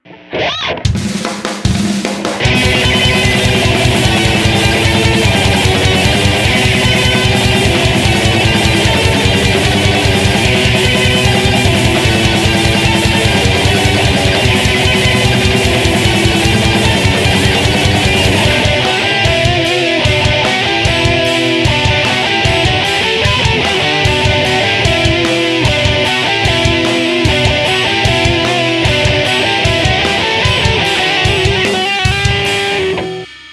prelude ii instrumental

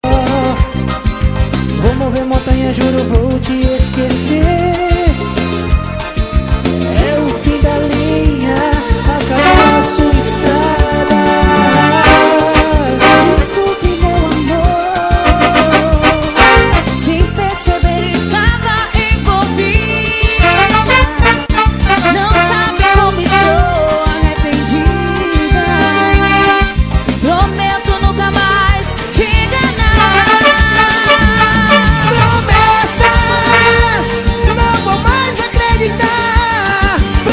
metal

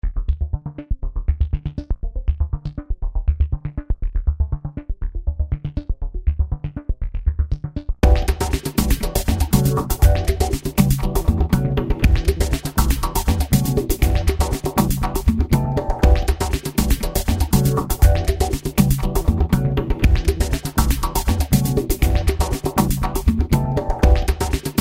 groove

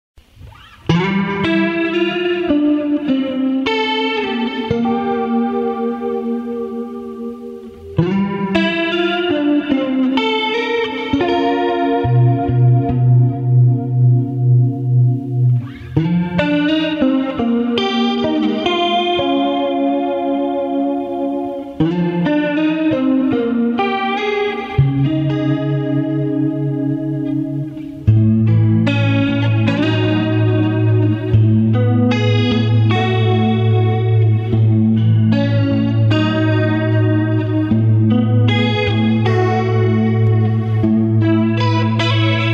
dream house

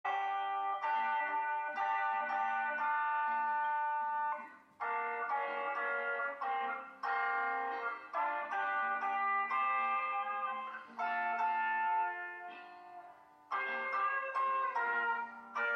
bluesy

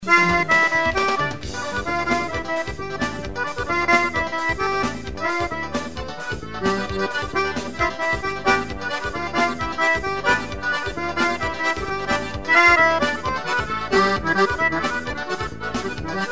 acordeon forro solado

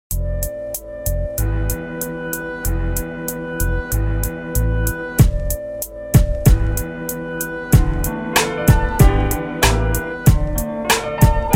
instrumental

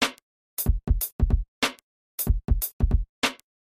hip hop shake base

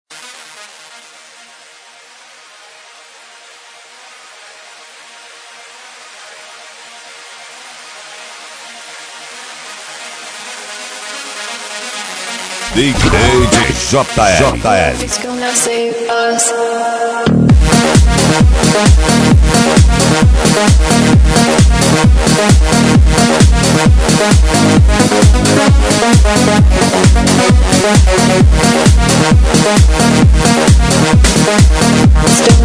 dj mix

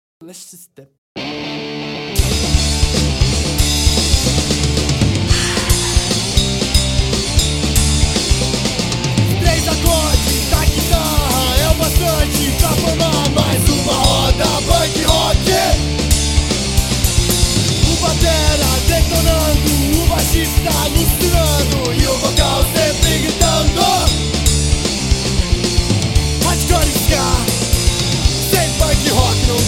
punk rock

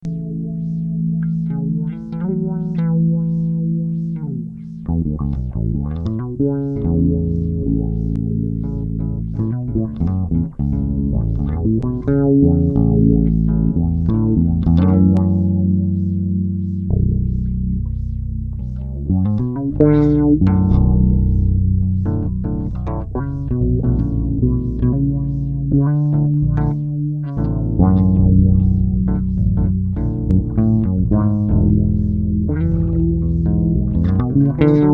bass

